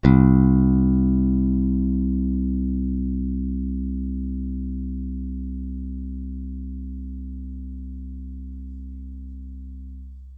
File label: hold, Notes, Guitar